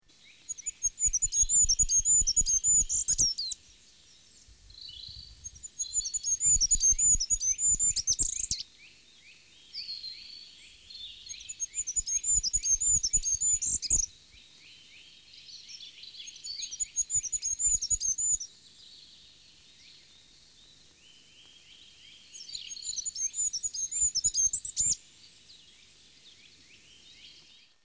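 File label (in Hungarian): Sárgafejű királyka; Madarak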